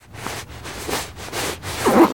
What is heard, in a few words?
Prisoner Clothes On